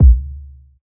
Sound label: huge steel kick drum b key, Royality free kickdrum sample tuned to the B note; kick drum samples